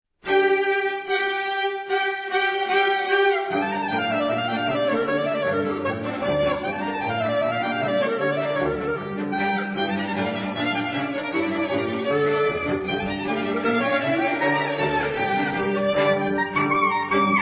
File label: Jewish Wedding, Chamber ensembles